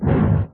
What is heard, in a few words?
JUMP